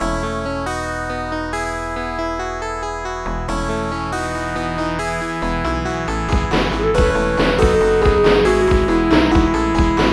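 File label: background music; 1941 - Counter Attack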